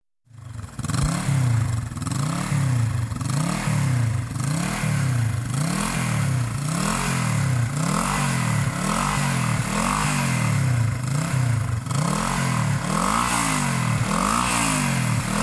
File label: Motorcycle Engine Revving